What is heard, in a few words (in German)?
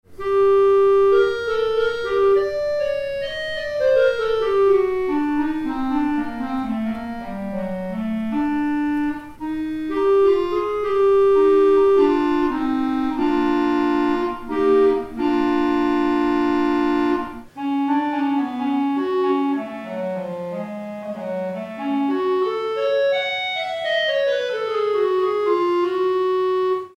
Clarinette